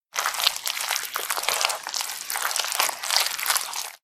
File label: blood guts; lastshot